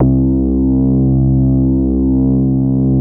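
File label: house bass; ZERO-G; Datafile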